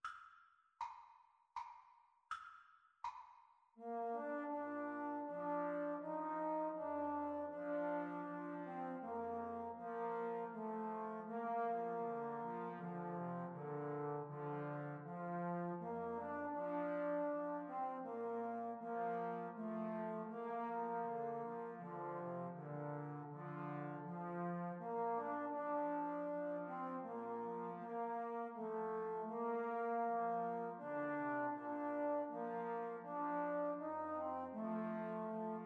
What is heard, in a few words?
Es Wird Scho Glei Dumpa (Austrian Trad.) for Trombone Trio